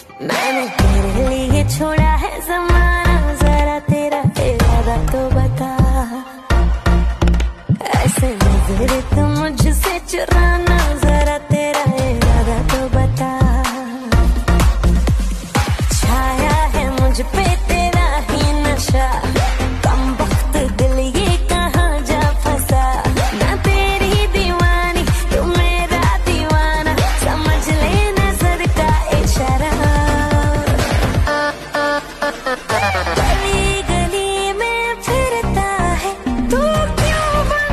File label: Romantic Hindi Song